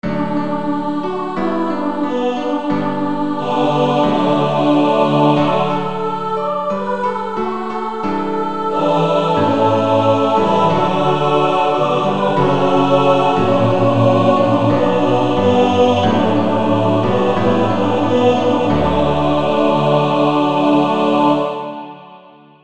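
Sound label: with Piano; Somebodys Knocking at Your Door; TBB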